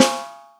Snare Soft; Lightning1024

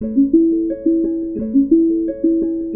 Guitar 174bpm Bm, LIQUID; DNBACADEMY

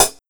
HiHat Closed

